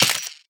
weapon c4 impact; physics; weapons

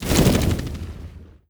fly end, antlion wings; creatures

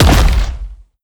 step up left, creatures strider passby; seq passby

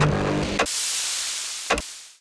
headcrab canister ambient; Half Life Model Pack; Half Life 2; ambient levels canals

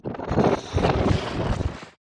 xen infest plant stalk; infestation; Half Life Alyx

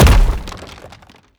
bash medium, brickwall, destruction

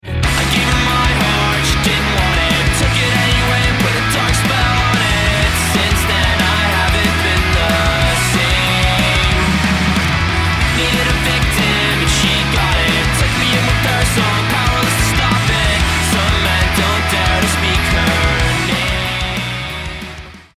start action, music

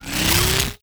electrocuted end

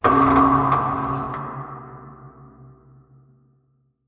train squeal; Portal 2

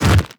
twitch, zombie blind, foley; creatures

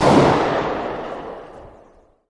scar20 distant; weapons; unsil; Counter Strike Model Pack; CS:GO

